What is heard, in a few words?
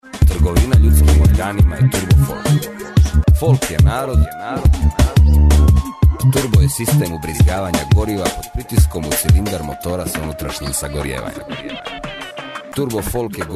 folk organ